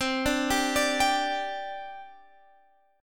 C Suspended 2nd C Guitar Standard; arpeggiated; 20,17,17,19,20,x; JGuitar

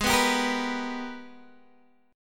G sharp Minor Major 9th, Guitar, Open D; 18,14,17,16,14,x

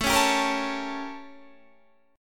A 7th Flat 9th Guitar Open D

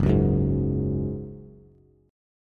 F sharp Suspended 2nd, Bass; strummed; JGuitar

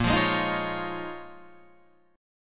A sharp Minor 11th, down; strummed; JGuitar

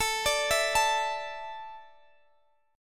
A Suspended 4th down Guitar Open G

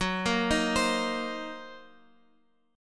Gb 6th Flat 5th Gb down Guitar Open F; arpeggiated; Gb6b5; JGuitar

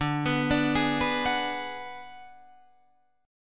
D 6th Flat 5th D down Guitar Drop D, chord

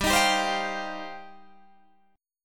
G 7th Suspended 4th Sharp 5th, Guitar Standard; G7sus4#5 strummed

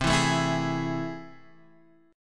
A Minor Major 7th, C

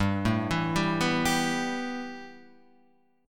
A 7th Suspended 2nd, G

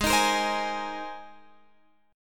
Ab Major Add 9th Ab, Guitar Open F; Abadd9; strummed

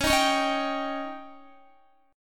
Db Suspended 2nd Flat 5th Db down Guitar; strummed

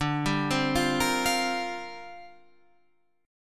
C 7th Suspended 2nd Suspended 4th D

